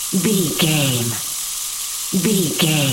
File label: gas leak loop